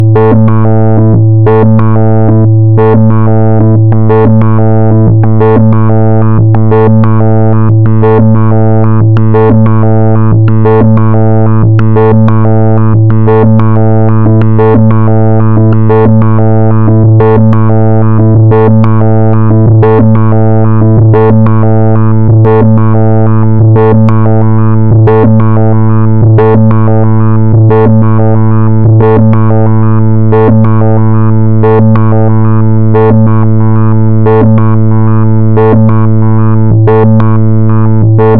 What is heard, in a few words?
stepped filter